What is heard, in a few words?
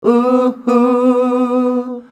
UUUHUUH, MALES; Best Service; Hyper Dance